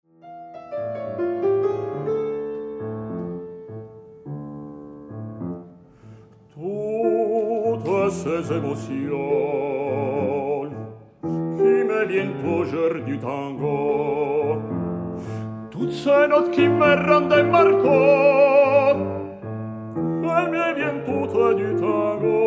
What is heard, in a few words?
Tango Tenor